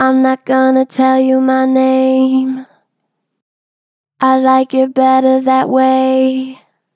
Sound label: Pitch Flat Gentle, Vocals; Harmor Vocals